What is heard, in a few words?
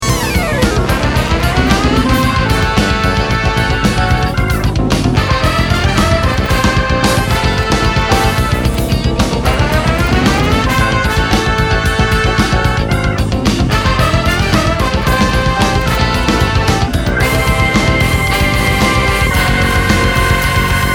horns